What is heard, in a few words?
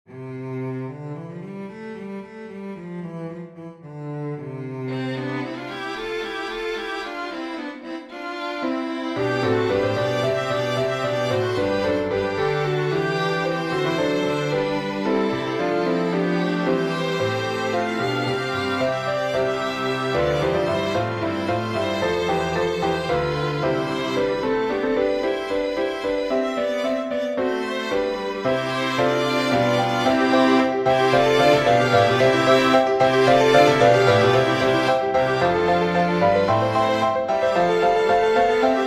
for piano string quartet; haendel georg friedrich; hallelujah amen from judas maccabaeus